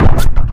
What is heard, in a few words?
ministrider footstep